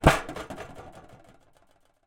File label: foley small metal case tin lid open drop down; zapsplat